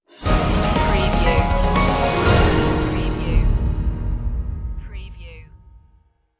dawn of a crime stinger

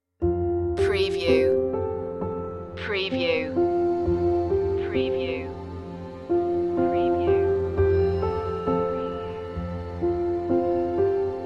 piano and strings

